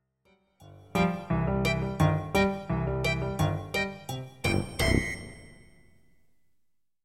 Marsch der Clowns; Abenteuer Klavier Erfolge 3 Hauptband

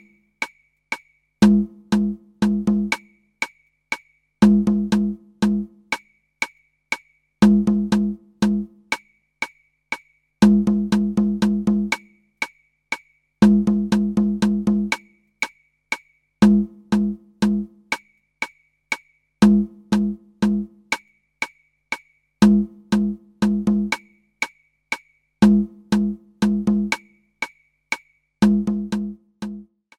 Instrumentenecho; Der Rhythmus-Globetrotter

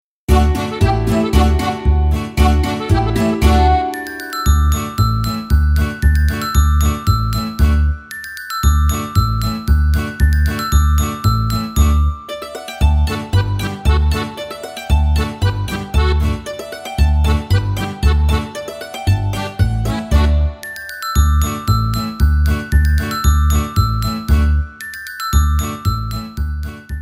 macht alle mit instrumental

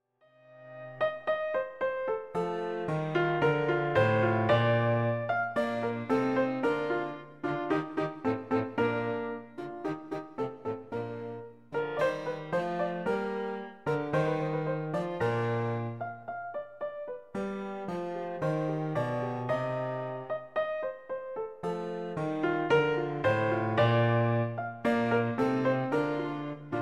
Allegro in B-Dur; Abenteuer Klavier Erfolge 3 Hauptband

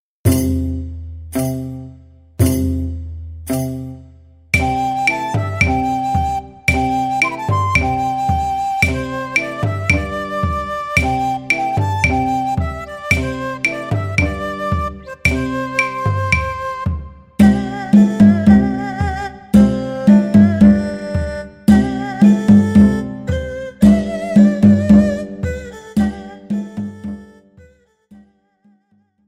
drachenfest orchester instrumental

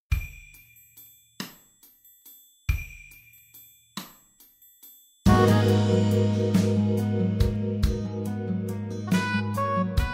Tiere erstarren (instrumental); Die Rhythmus Raupe

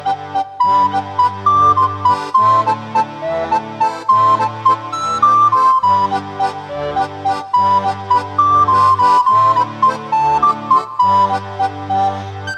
Schottischer Tanz

